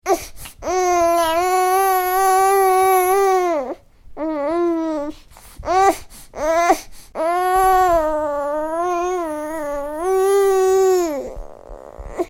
Baby: making vocal noises, upset, nearly crying; Babies; Stuart Duffield

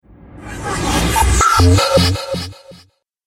Radio Stinger; sound design; Stinger Sound Effects

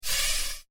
A Stroke Of Horror; Evil Sound Effects; Andrew Fleming

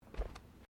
Footsteps Bare feet Carpet Step; Footstep Foley; Footsteps (Sound Effects:Human)

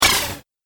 Droid Flyby Shot, robot; Robot Sound Effects

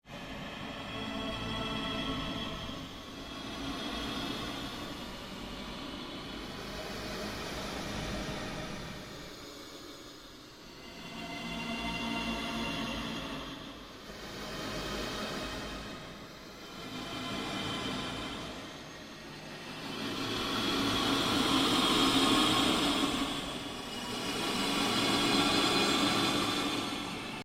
Ambient Drone Ghost Chatter

